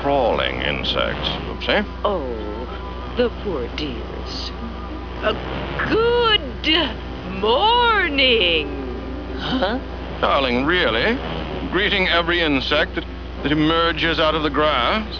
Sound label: CRAWLING; Crawling insects, see?... (The Wasps greet the Antz)